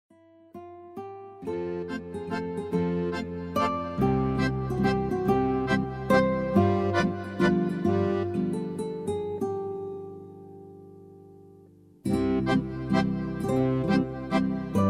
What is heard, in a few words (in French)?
instrumental